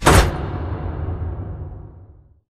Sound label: sliding door lg verb close stop; Titanfall 2